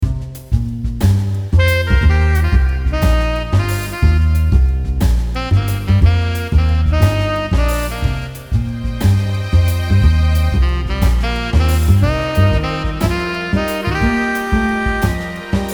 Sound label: Alternative Sax